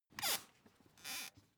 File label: office chair creak; objekte; stuhl